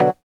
SYN ORGAN; Sound & Vision - Gigapack II CD 1

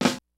FUNK, DRUMS; Kid Nepro; Elite

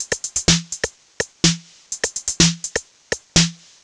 drum loop under top 125, Classic Chicago House; Sample Magic; Drum Loops

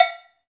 COWB, Cow Bells, Roland; Club-50 - Foundations Roland; CowBel+Metl

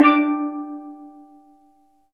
steel drum

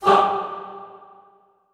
FAH G2 R, Extended Classical Choir; Best Service

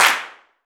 Amb Clap; Roland BK-5; Claps & Snaps

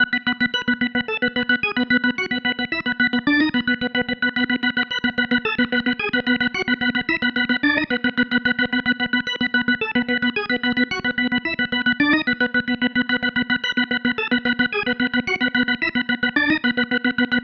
Psychedelic, Organ, Loops

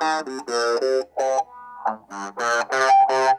NOTE BOX, Funk Guitar; 90 s Sample CDs; Zero G

